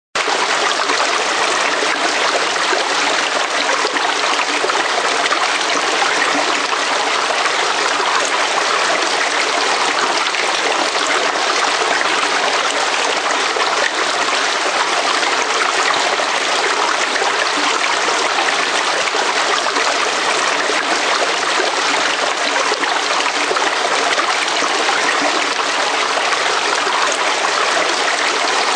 Crystal River, Constant sound of a clear running river; River Sound Effects; Nature